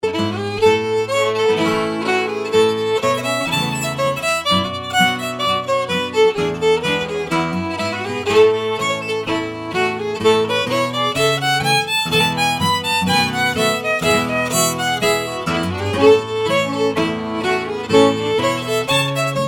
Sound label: Hornpipe; Gateshead; James Hill